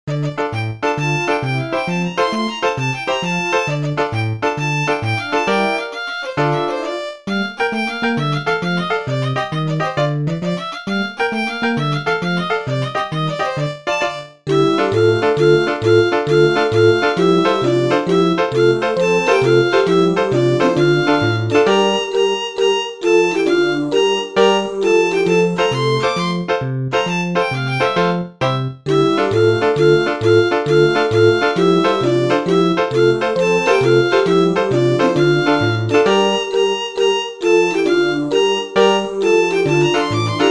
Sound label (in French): chapelloise; taderfia